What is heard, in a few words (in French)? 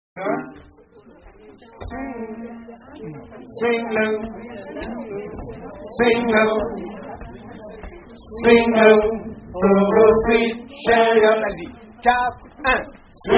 hommes; Swing Low